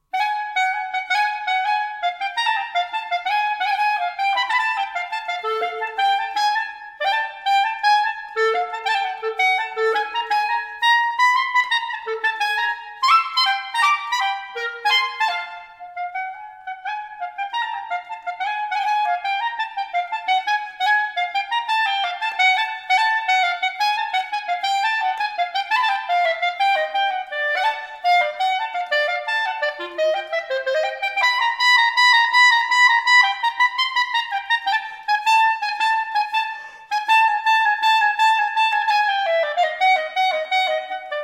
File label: solo clarinet; Stravinsky